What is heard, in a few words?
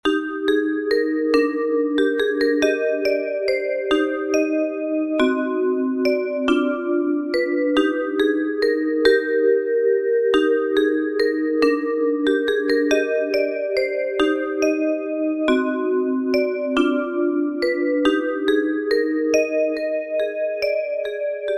waltz